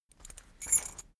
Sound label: key ring small trousers pocket put